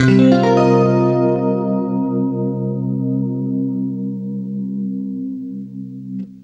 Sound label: CLEAN CM9, Guitar; East West; Steve Stevens Guitar Samples Collection